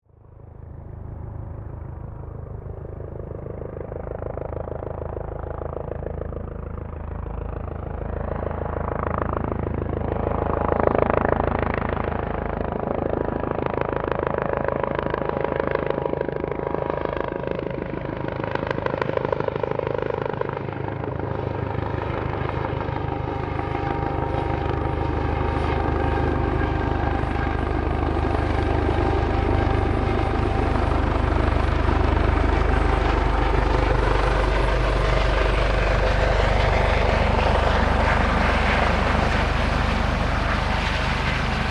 Helicopter Land, Aircraft, Helicopter, Land, Close, Hh-65, Dolphin; Transportation